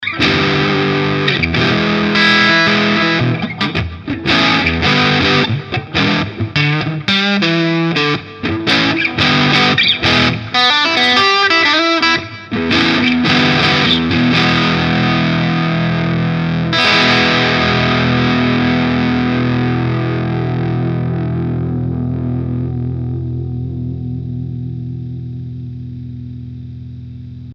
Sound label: half gain hals; BEETRONIX